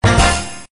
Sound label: WIN TINY; Ultra Sevens